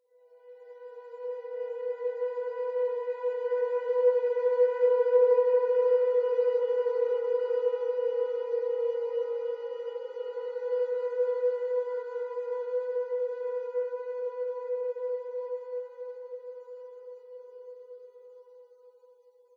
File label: String